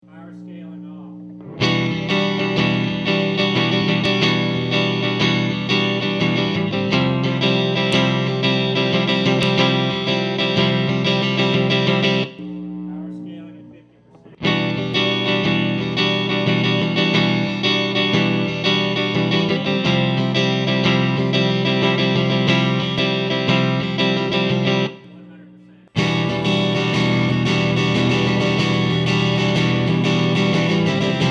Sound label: Power Scaling Clean